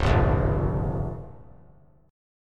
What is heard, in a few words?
C sharp 6th Flat 5th C sharp down; strummed; C#6b5; JGuitar